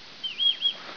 rat

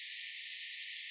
gryllus

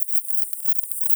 Myotis daubentonii, batcalls, europe

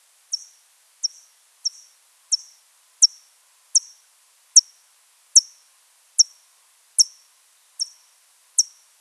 Pipistrellus pygmaeus

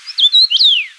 karmin, avisoft

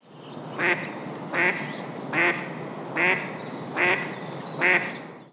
Anas platyrhynchos

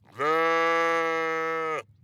sheep

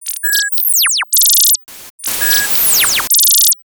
compressed ATRAC

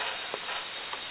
mouse juv

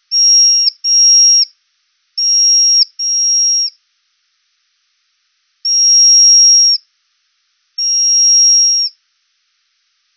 Rhinolophus hipposideros

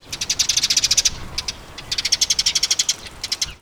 Passer montanus

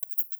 Nyctalus leisleri

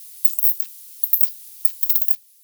Nyctalus noctula, batcalls, europe

